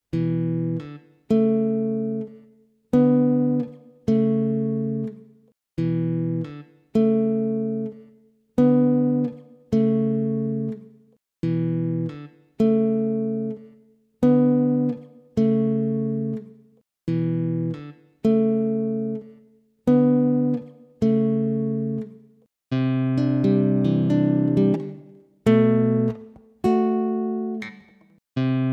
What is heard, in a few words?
Jazz Blues in Em 85 bpm